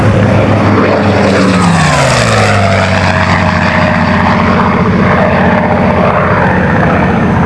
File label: bf109 flyby; Animations